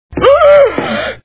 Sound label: gasp; courage